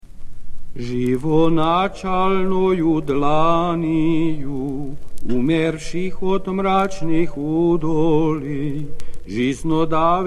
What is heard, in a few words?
Tone 6 Kondak; Papp Irmologion